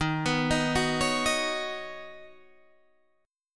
D sharp Minor 7th Sharp 5th D sharp, down, Guitar, Standard; D#m7#5 arpeggiated